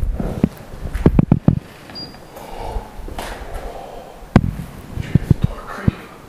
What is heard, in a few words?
door slam creek